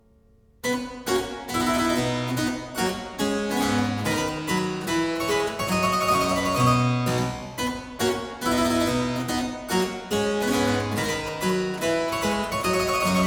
Trumpet tune called the Cibell, harpsichord; Complete Chamber Music; Brilliant Classics; Miscellaneous pieces for the harpsichord

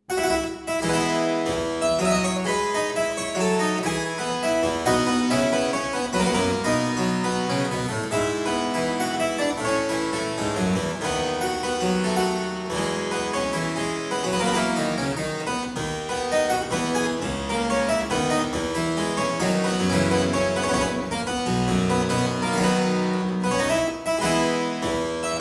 Suite in F major Courante, Harpsichord; Buxtehude; Complete Harpsichord Music

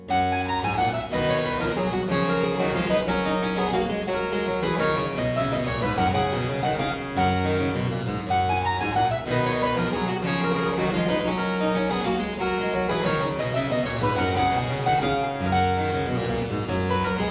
Aria in G major Partita 23, Harpsichord

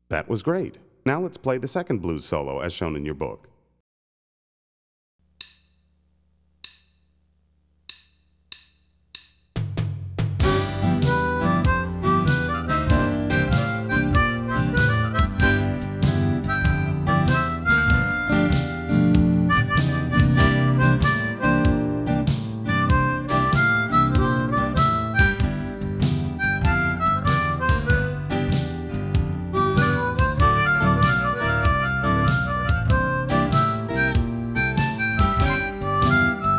Twelve-Bar Blues Solo, harmonica; Play Harmonica

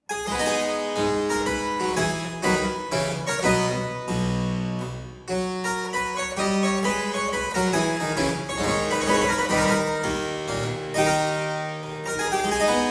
Courante in A minor - Variatio, harpsichord

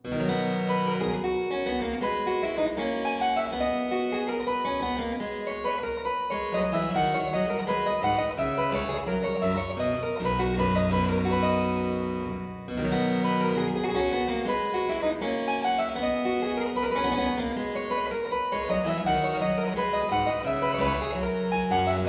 Aria in C major - Variatio, harpsichord; Buxtehude; Complete Harpsichord Music; Aria 'More Palatino'; BuxWV247; Variatio 3

